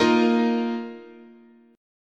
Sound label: Bb Suspended 4th, chord, guitar